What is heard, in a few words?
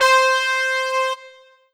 Yamaha EX5 MyBigSectn C5